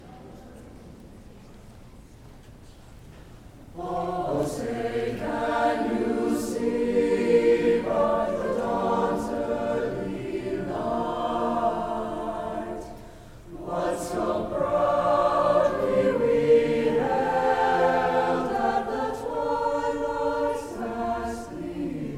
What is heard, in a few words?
national anthem, choir